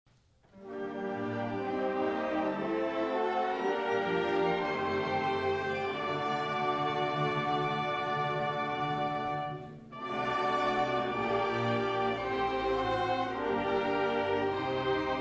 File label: Wind Ensemble; LAVC; Auf Meinen Lieben Gott